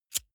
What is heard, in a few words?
lighter wheel spin soft